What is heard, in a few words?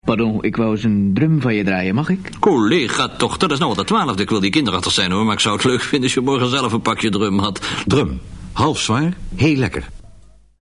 drum